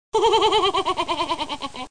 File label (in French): Rire; SouthPark